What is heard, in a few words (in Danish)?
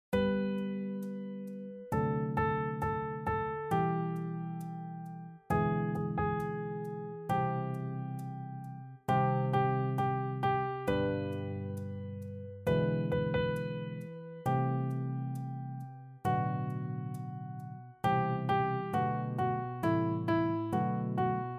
Sopran; Bryllupssang